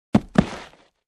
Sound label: Boxes being stored